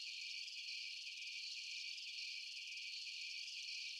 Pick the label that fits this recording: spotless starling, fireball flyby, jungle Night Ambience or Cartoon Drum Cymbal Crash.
jungle Night Ambience